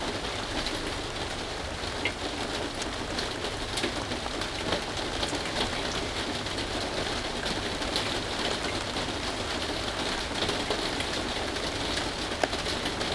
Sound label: Rainforest